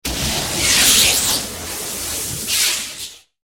Sound design element energy bolts electricity electrical beam; BLASTWAVEFX; 能量音效